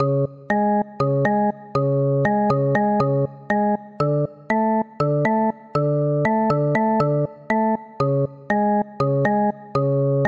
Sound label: Breaktime loop